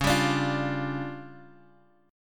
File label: D Minor Major 7th Double Flat 5th C sharp, guitar